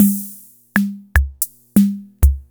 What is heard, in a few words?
concertmate